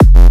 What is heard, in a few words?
KICK BASS F ONE SHOT